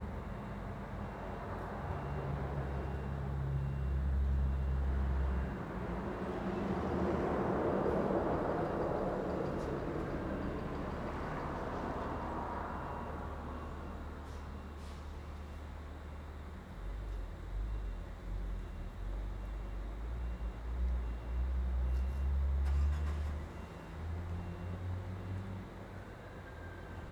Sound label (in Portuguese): Ambiencia posto de gasolina carros na estrada, alarme de caminhao dando re; e partida; transito; Colecao Sonora do Cerrado